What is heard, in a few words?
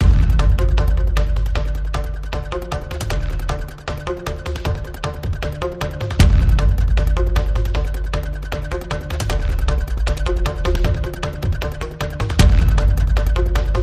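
percussion pulses and FX only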